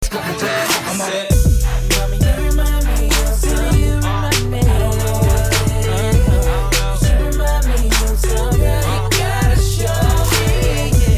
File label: funk; multitrax